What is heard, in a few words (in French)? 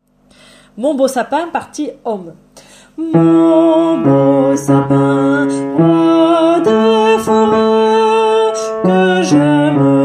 Partie Hommes; Mon beau sapin